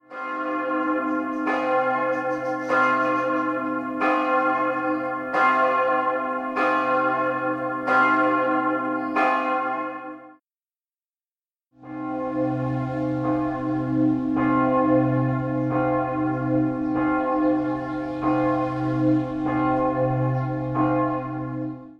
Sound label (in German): Reichenau Mittelzell c Glocke vorher nachher